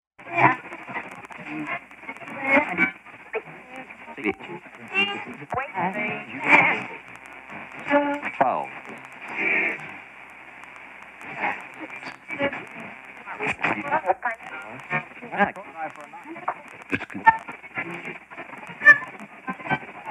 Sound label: Rolling Radio Dial LoFi